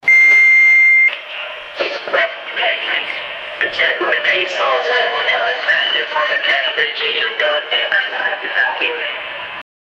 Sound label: the sound of a friendly, community